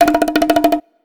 Hand Drum, notifications; Huawei HarmonyOS 2.0

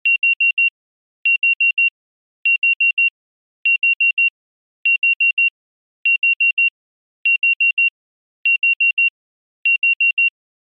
Beep

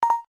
success, phonetones ui

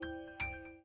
Unlock; Samsung Galaxy Note 7; Zero Particle

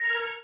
power open; Motorola ROKR-Z6

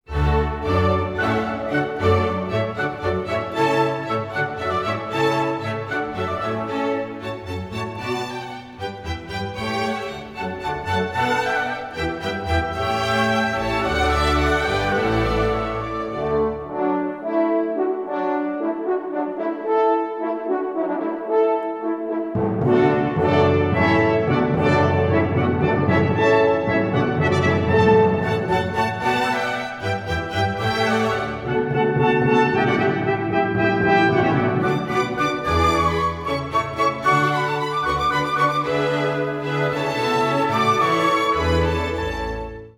Water Music Hornpipe; Samsung Galaxy A57; ringtones extra; Calm; Handel

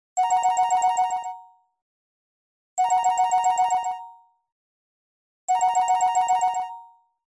Ring

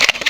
Shutter; LG; TU500

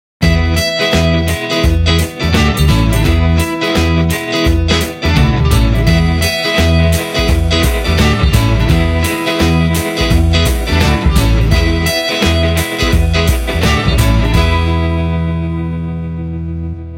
Steely, Ringtones; Nokia; Asha 300

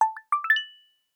decorative celebration; Google; Material Design sounds; Hero Sounds

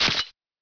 camera click

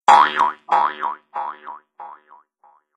Bounce

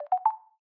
Charging Started

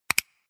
star, Keytone sounds Tada; KU990i Viewty

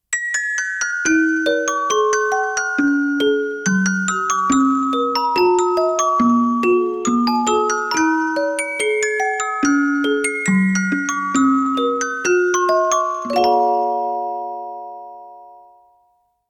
wonderful music box; ZTE Grand-S-2; ringtones

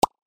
notification beep sound

